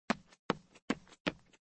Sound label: walk